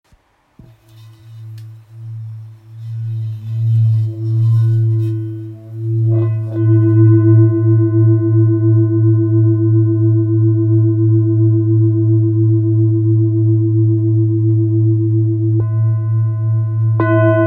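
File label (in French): Bol full moon grave la, bol chantant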